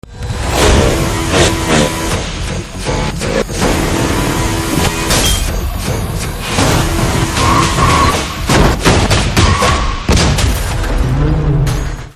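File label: Trailer background music Theme Ringtone; Valimai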